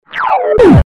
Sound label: wrong